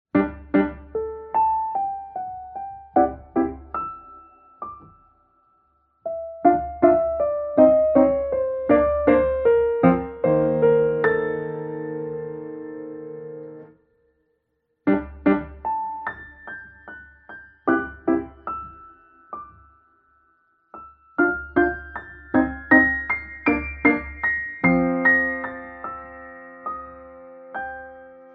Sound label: Piano cover; Animal Crossing： New Leaf